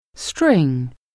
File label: string